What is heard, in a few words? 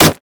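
sad mummy basic attack; LoL; amumu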